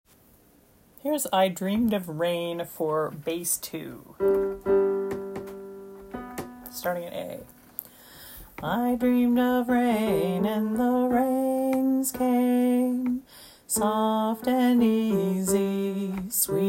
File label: bass